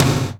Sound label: TOM, Drum Dry; East Collexion; Drum 1